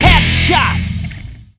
headshot

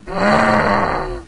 baby alert; cof

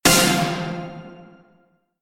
word success pinch; typing; tf2ware ultimate

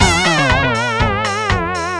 failure; tf2ware ultimate; music game; ds diy microgame

